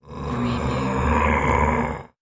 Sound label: Monster Frankenstein; sound-effects-bible